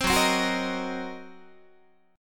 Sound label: F sharp 6th Flat 5th F sharp down Guitar; F#6b5; strummed